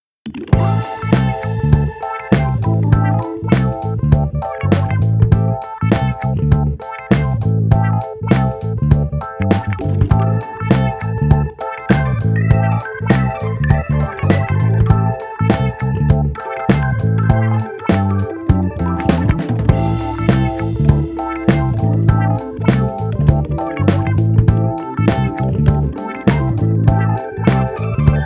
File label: Metal Fury Rhodes; Metal Max; Data East